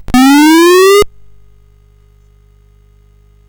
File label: sid CSG8580R5; Frogger II-Three Deep